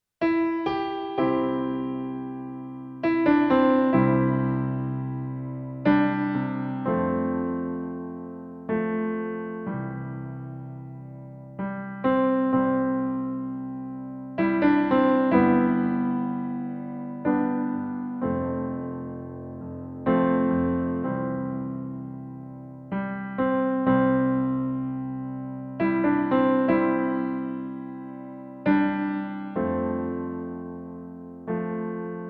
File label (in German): Playback C-Dur